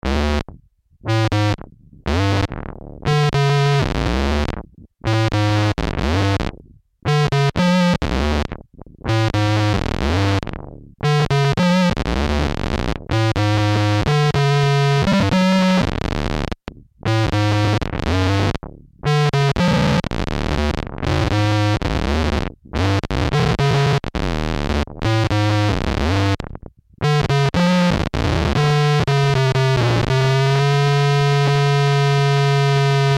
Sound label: Synth Square Short Attack